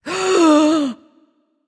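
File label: breath, female voice; smite; voicepack; hera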